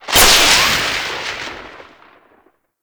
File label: Void Storm Pillar Lightning Strike; Gameplay; Railjack; Warframe